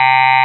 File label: android, Digital Synths